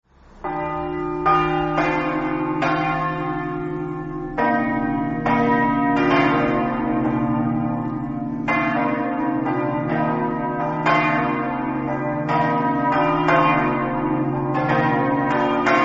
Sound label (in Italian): campane; talmassons